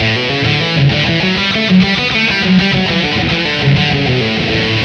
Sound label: lead, 100